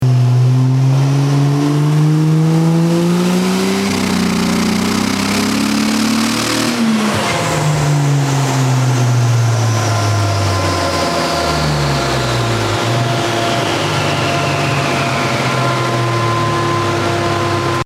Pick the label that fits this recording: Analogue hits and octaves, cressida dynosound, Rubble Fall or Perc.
cressida dynosound